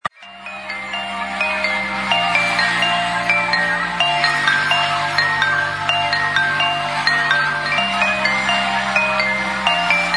ringtones; gmod; perp2.5

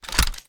inspect

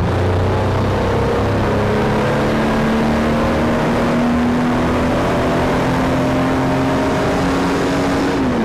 third, vehicles

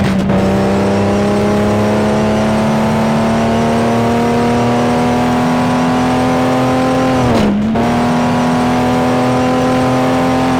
third, vehicles

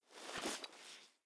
mp5 start reload; weapons; gmod; kryceks swep; navaro mp5

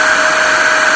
turbo, vehicles; gmod; tdmcars

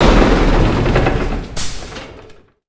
v8 stop; ratmobile; vehicles

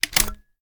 reload empty fast open; gmod; wfoly plr sh charlie725

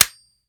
first fire, weapons; gmod; viper

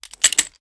charge reload cylinder open; gmod

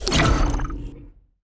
ui cards down last, user interface store; gmod; mw

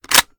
reload bolt open; gmod

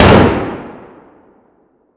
shotgun fire atmo int; gmod; viper

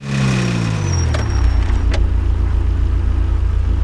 throttle off, vehicles; gmod; tdmcars; gtav; pol sedan

